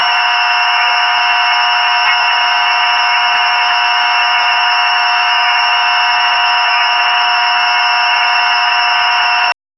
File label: idle sound of the electri, electric vehicle